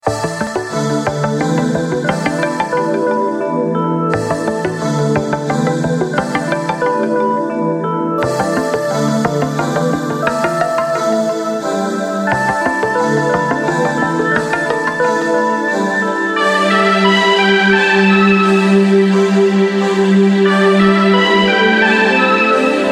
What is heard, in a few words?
Bgm Fantasy; rpg maker mv